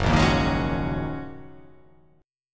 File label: F sharp 6th Add 9th, Guitar; strummed; JGuitar